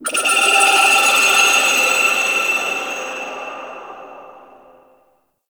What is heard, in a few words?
Clicky Texture; Ambience; Warframe; Entrati